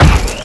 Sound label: fall pain; Dystopia